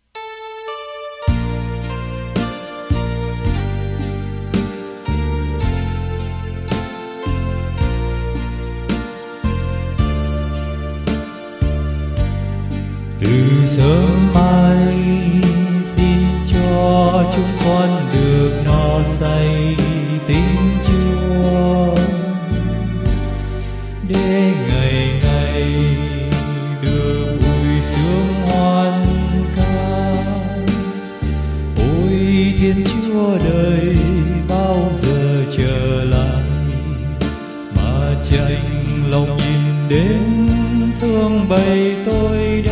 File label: BASS